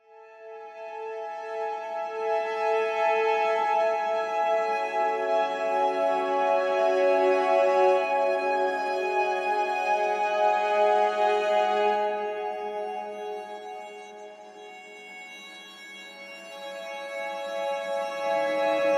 vertigoviola

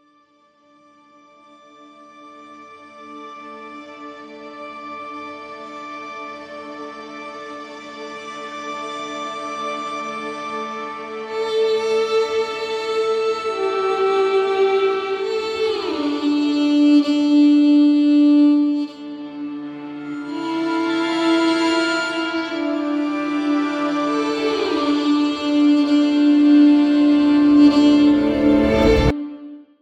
violin sheer

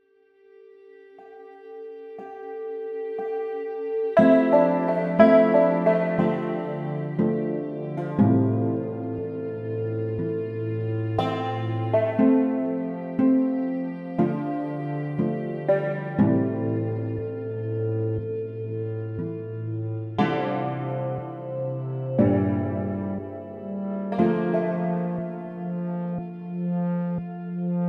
santur

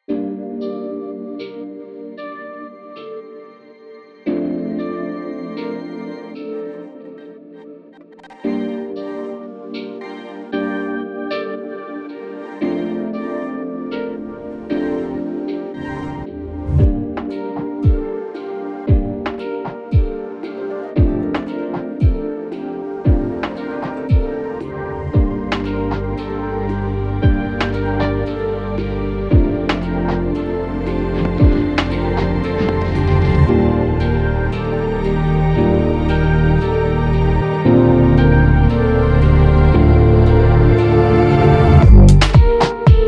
pan drum

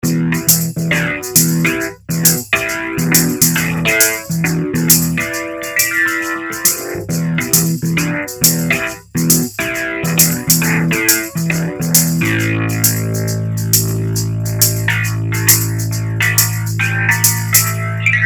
rhodes

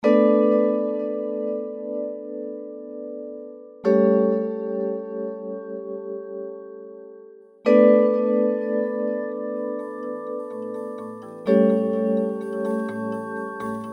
vibraphone

